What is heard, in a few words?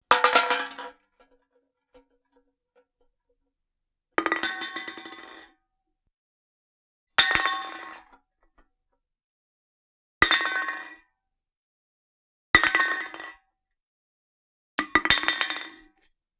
Can Drop, Drinks Can